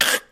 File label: gas gasp, smoker, voice, idle; Left 4 Dead 2